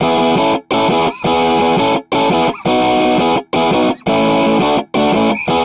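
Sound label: Guitar Punk E major 170bpm; Guitar and Bass; Ableton; Factory Packs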